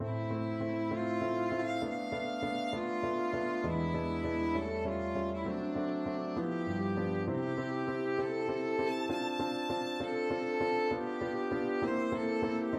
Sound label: violin